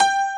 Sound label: Honky Tonk Piano; cubic